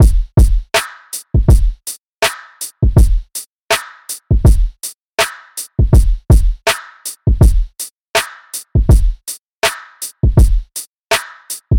162 BPM Dm

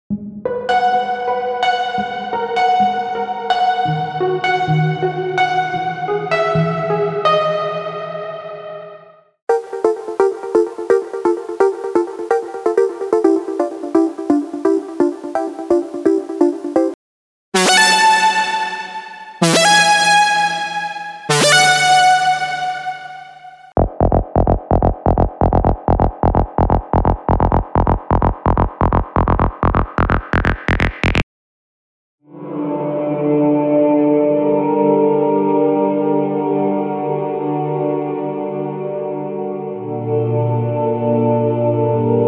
Techno & Melodic Techno; unisynth

